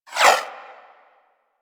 Laser Whizzby; Warframe; Weapons

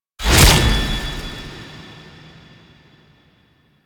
Sword Stab; Cinematics; Ballas; Operator; Warframe; The New War; Throne Room; Quests

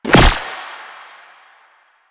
Gunbrella Bullet Deflect, Weapons

